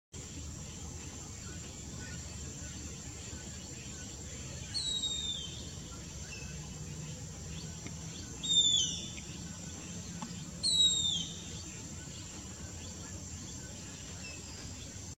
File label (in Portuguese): Canto do gaviao de cara preta